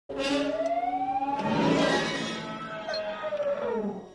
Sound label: Dragon Howl; Krayt